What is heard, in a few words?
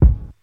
nineties original bass drum c key; Duration 0:01; Mono